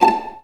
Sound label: VA PIZZ A2; Best Service; Peter Siedlaczek's Advanced Orchestra